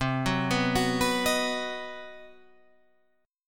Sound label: C Minor Major 7th Flat 5th chord, Guitar Drop D